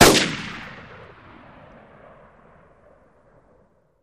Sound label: Gun AR-15 Single Shot Fire